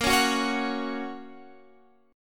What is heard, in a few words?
A sharp Minor Sharp 5th, Guitar Drop D